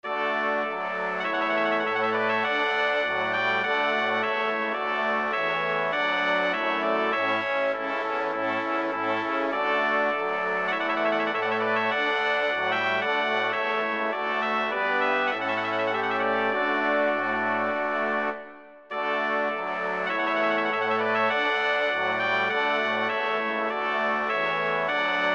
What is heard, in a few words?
Brass Quintet